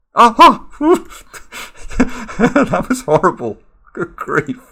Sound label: ha ha! oompffhmmhmhm